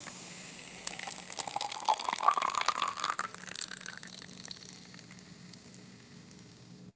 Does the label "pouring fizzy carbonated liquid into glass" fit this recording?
Yes